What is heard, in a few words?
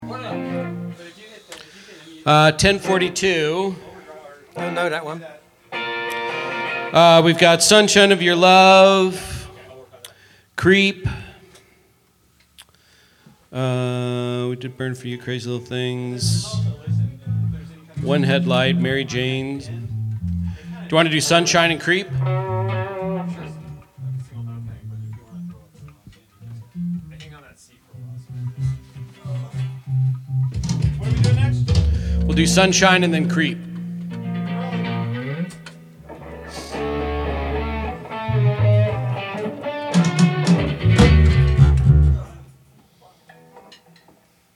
Vocals and Background